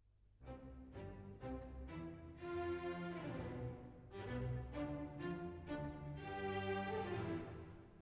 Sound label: Piano Concerto 1st movement; Mozart; Piano Concerto No. 21 K.467